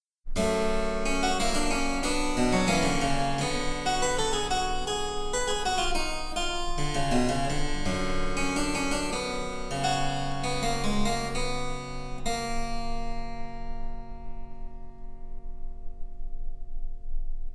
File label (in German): Cembalo; Mark Lindley WTC 1